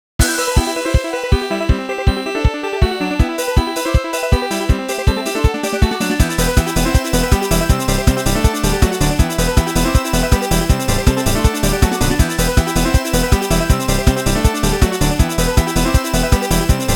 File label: Techno; Sony Ericsson